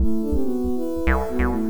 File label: acid 140, synth loops